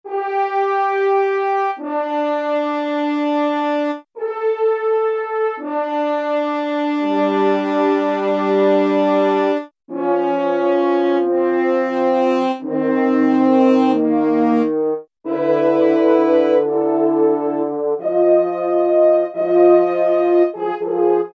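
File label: French Horns Ambient; Brass And Woodwinds; Roland L-CDX-03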